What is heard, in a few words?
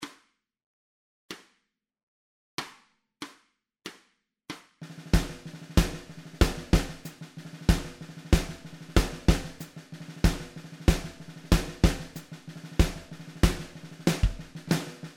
Snare